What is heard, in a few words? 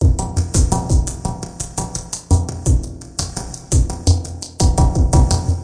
170 bpm used to be latin, drum loops and breaks